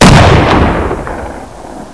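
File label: double barrel, weapons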